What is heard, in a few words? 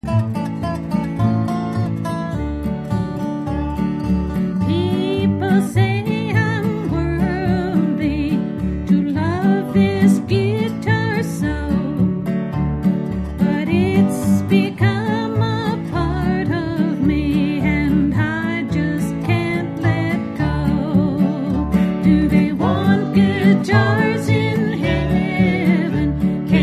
Guitars